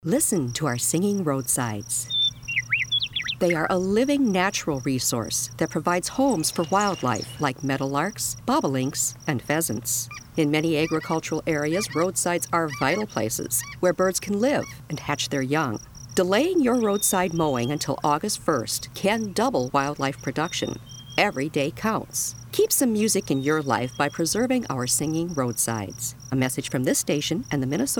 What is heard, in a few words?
singing roadside